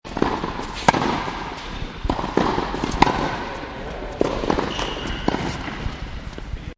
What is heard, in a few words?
Tennis Courts; Sports; Partners In Rhyme